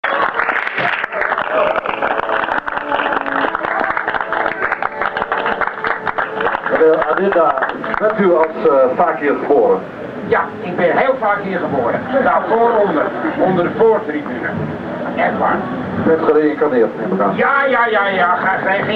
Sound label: shrine dictorphone mexico, street recording